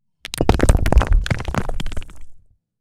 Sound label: rocks